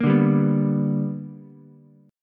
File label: B Minor Major 7th Sharp 5th B, Ukulele; strummed; JGuitar